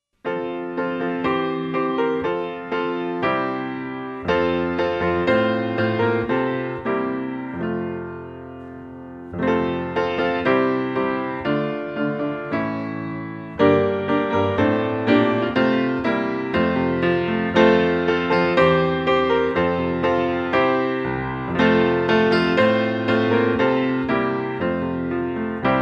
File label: hymns, piano; Turn Thou From Evil